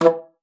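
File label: E3, bass flute; Xsample VOL-2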